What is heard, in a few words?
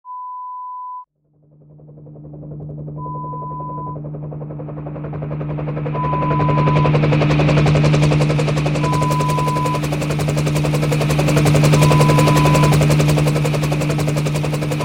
Helicopter sound